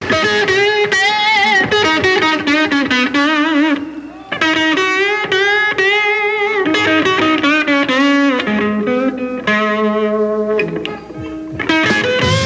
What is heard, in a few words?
Instrumental Jam